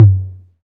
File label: low floor tom drum sample f sharp key; tom drum samples